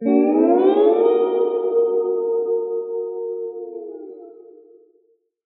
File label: cave